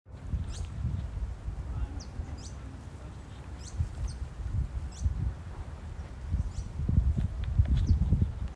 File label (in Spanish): Canastero coludo, Lenheiro-de-rabo-comprido (Asthenes pyrrholeuca)